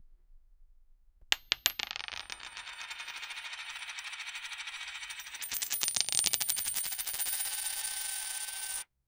single coin falling into

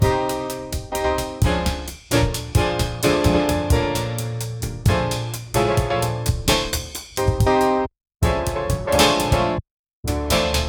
something spacey slow jaz

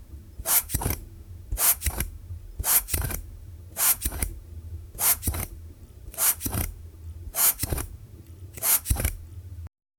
soft professional grade, hair

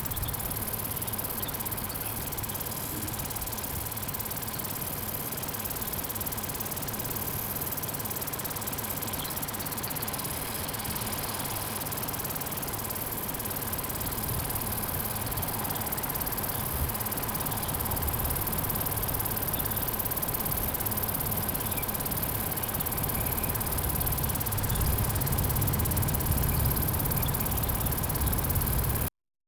chill peaceful wind on th, meadow

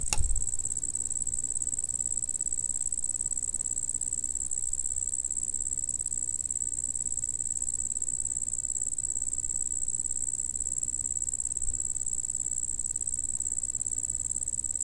crackling bonfire cricke; SFX Engine

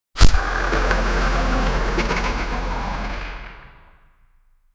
anaconda blocks, snake

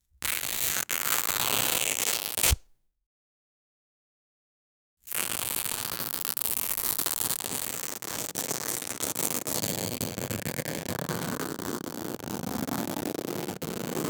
constant fabric tearing; textures

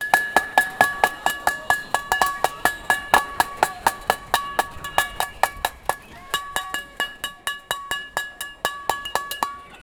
realistic festive sound, festive

